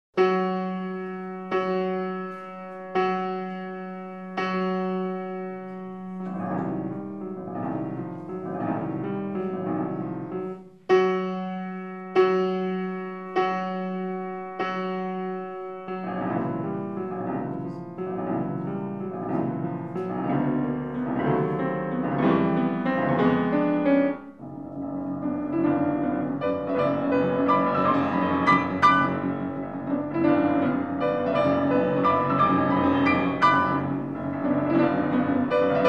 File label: Piano live; Liszt Piano-Recital; Meyerbeer Robert le Diable